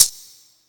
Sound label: Maracas, Yamaha PSR E305